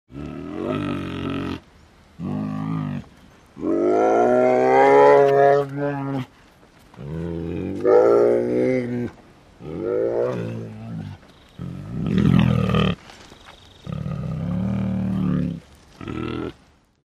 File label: Bear Growls